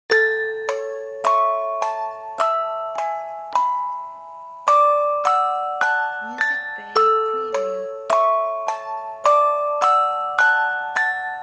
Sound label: Baby Sleep Music Box